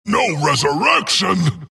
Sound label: death long; dota; skeleton king